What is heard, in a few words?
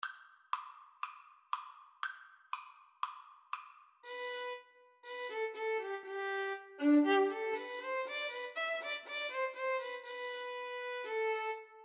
VLN VLA duet, Violin Viola Duet; Mozart, Wolfgang Amadeus - Twelve Duets k.487 no.5